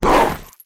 Wolf Charge Attack; Wolf Link

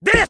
Jump Strike; Link

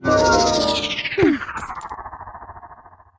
Transform Wolf

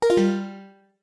Pause Menu Close

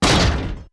Iron Boots Step

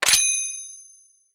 Gale Boomerang Lock

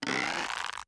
Bow Pull

